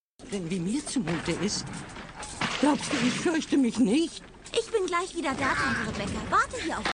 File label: aargh